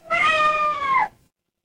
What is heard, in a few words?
animal cat meow